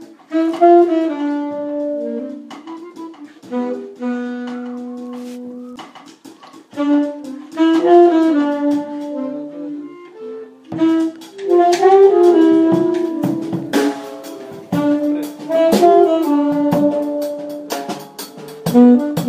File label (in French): Cool reggae Basse